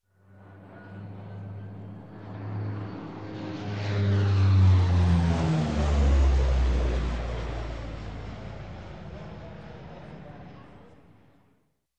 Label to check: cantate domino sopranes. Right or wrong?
Wrong